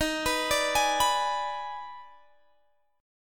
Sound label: D sharp Major 7th Suspended 4th Sharp 5th; D#M7sus4#5; arpeggiated; chord shape